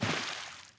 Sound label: wet step; DAoC